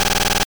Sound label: Razor Leaf